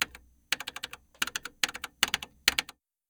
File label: Buttons; Vestel; Venus